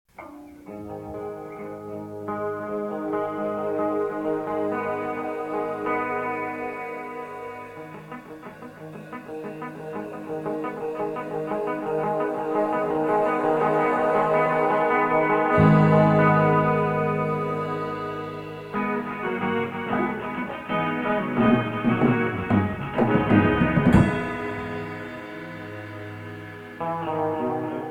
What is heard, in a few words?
Instrumental; rock